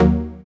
TECHNO Bb2, dubstep; Jack Sparrow Deep Dubstep Progressions

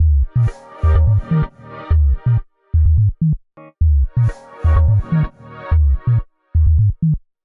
126 Eb COMBI GROOVE; Loopmasters; Funky Underground Vol 2; COMBI GROOVES

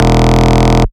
Bass Shot E; Mutekki Media; Vengeance Electroshock Vol.2; Bass Shots

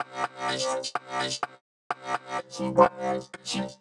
126 C VOCAL LOOP, Funky Underground; Loopmasters; Funky Underground Vol 2

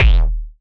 Kick Fart; Drum Hits; Kicks; Loopmasters; Jon Carter & Alex Blanco; Block Party Essentials

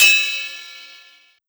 Ride, Cymbals

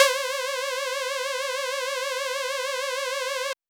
Dirty Dutch Lead C3; Vengeance Electroshock Vol.2; VES2 Dirty Dutch Special; VES2 Dirty Dutch Leads

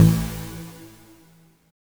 NOISY E2; Jack Sparrow Deep Dubstep Progressions

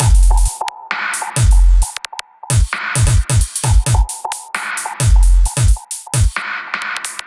Bpm132 Blip Break, Dubstep Drum Loops

